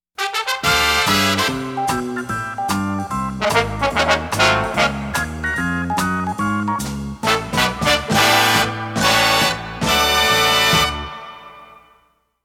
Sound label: Fan Fare; Pams; The Circus